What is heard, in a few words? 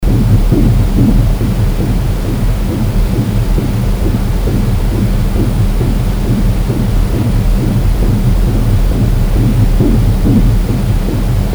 white noise for young ones; loopable